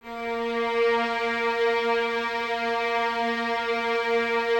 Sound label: A#3, String Ensembles Violins; Miroslav Vitous; 11 Violins